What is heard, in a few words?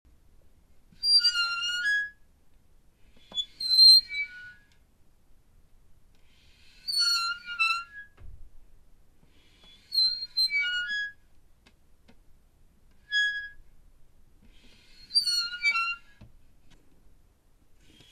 Metal squeak